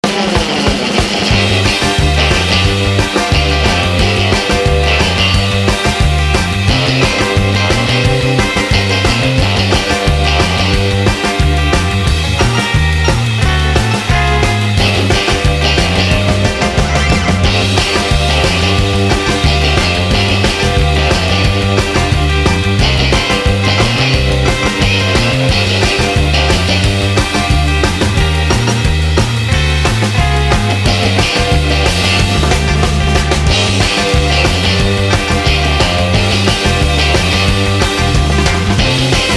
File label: Surf; Hyppönen&Enbuske; tunnarit